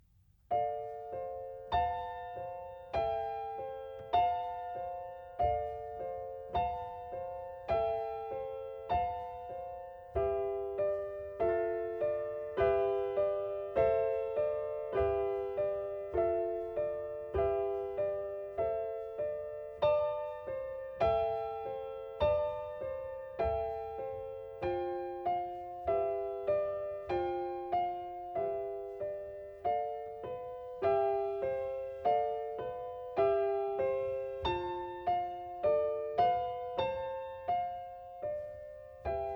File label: piano, Berceuce